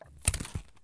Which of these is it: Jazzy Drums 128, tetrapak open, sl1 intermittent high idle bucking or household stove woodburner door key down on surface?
tetrapak open